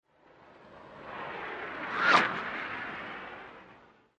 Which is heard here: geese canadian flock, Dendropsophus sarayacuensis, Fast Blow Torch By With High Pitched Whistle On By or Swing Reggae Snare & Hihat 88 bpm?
Fast Blow Torch By With High Pitched Whistle On By